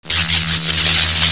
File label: zap